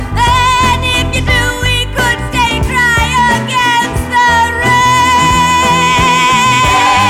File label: music; wu xing; earth